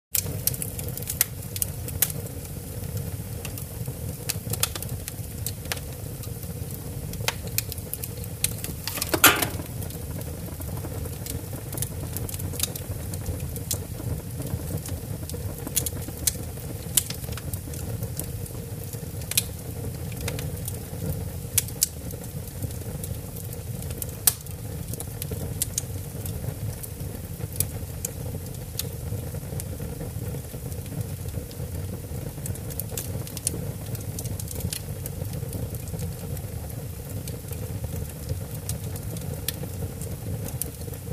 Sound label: Fire Crackling in a Fireplace